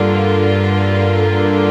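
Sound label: Pad G minor 7; Pad Gmin7; Sonic Foundry (Sony Creative Software); Orchestral Series VOL-4; Rock and Pop; Pads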